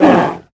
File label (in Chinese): 北极熊受伤声